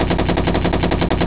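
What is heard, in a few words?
machine gun; GUNSBMBS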